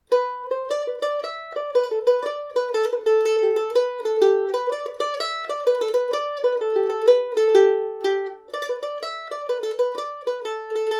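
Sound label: dingle regatta G major, mandolin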